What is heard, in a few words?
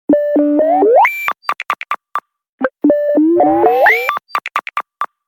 ripple, stock ringtone; nothing phone 2